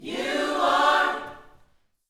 YOUTH CH PHRASE, Vocal Planet Gospel

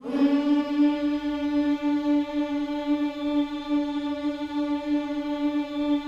HMMS D 4, Vocal Planet Gospel; Spectrasonics; Vocal Planet Vol.1 Gospel; akai

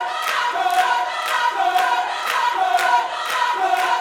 CIR JOY, Vocal Planet Gospel; Spectrasonics; Vocal Planet Vol.1 Gospel

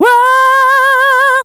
VOX

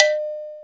R8 CB

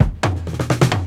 DRMROLL; E-Lab; X Static Goldmine 2; akai

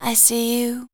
voice spectral, ly short

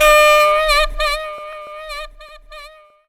SAX RIF; E-Lab; X Static Goldmine 2; akai; SAX RIFF1

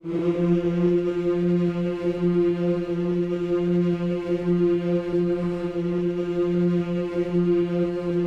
"hmms" F 3, gospel; Spectrasonics; Vocal Planet Vol.1 Gospel; akai

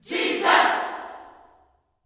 "Jesus", Vocal Gospel yell shout; Spectrasonics; Vocal Planet Vol.1 Gospel; akai

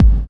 BD; E-Lab; X Static Goldmine 1

